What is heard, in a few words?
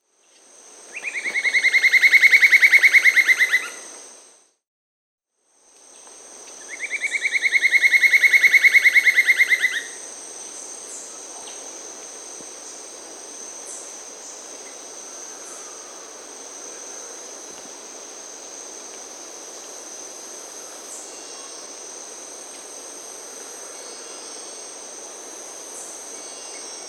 Black-banded Woodcreeper (Dendrocolaptes picumnus), Brazil, Birds of the Amazon; S. America